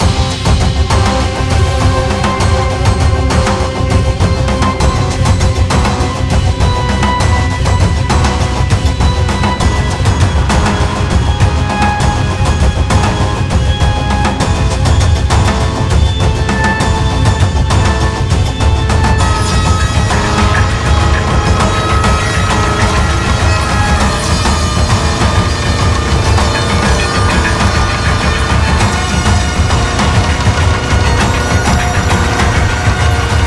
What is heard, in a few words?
Musik Coral Fight